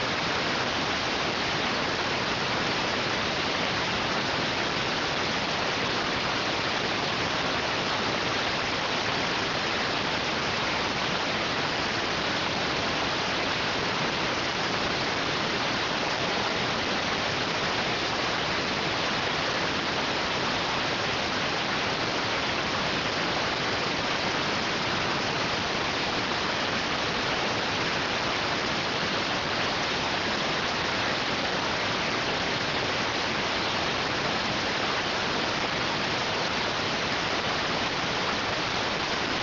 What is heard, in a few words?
rtty rx